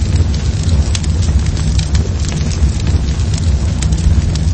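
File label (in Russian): пожар хрустящий активный мягкий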